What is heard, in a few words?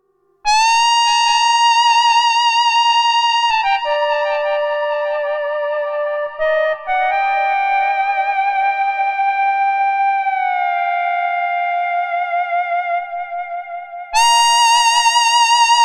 Alpha Juno 2 Flute Solo